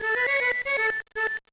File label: Nose Flute; gba; spongebob s atlantis squarepantis